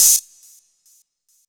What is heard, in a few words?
Chh, Drum Hits; Zero-G Trance Inducer; 90 s Sample CDs